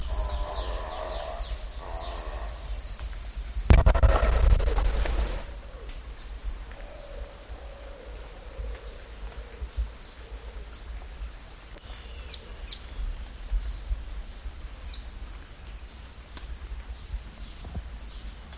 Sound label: Abbott's booby, پرنده; بوبی ابوت